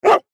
Dog Labradore Bark, Dog Labrador Bark Outside; Animal; Dog Sound Effects

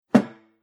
Single Dramatic Hit, impact; Miscellaneous Impact Sound Effects; Andrew Fleming

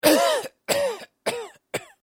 Coughing Male Cough; human sound effects; Sick Sound Effects

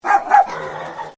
Animal Dog Chihuahua Bark Double Snarl; Newcastle; Various domestic dogs; Dog Sound Effects; Dogs (Sound Effects:Animals); Foundation1

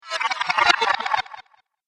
Robotic Nonsense; sci-fi; Robot Sound Effects; Sci-Fi Robot Sfx; Andrew Fleming

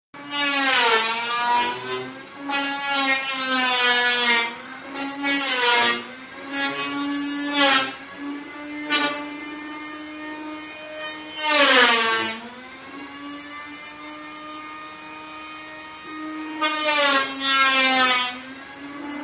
Buzz Saw; Saws; Machines; Partners In Rhyme

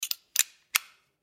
Razor Knife Change Blade; Hand Tool; Industry; Tools; Blastwave

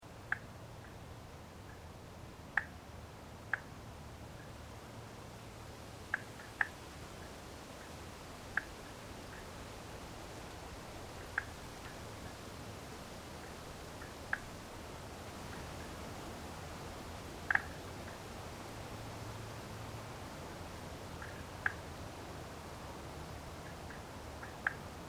Animal Frogs Gentle Wind Ambience Loop

